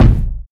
fluffy bass drum single hit b key, Royality free kick sound tuned to the B note